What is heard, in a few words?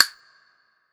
Castanet; Percs